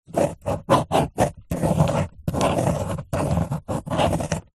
office pen ballpoint paper hollow table draw diagram